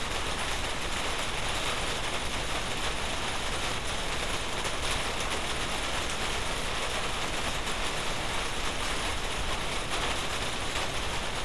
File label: Rain